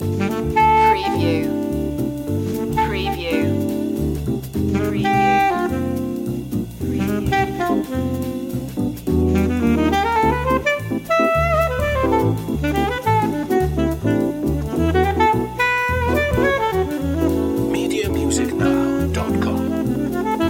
loop

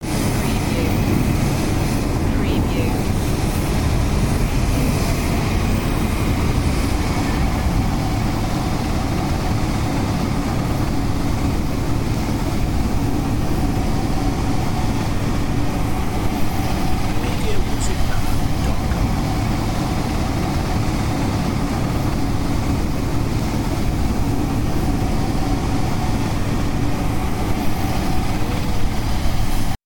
Jet Engn Rnwy

